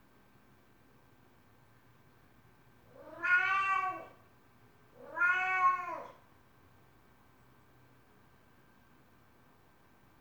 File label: yowl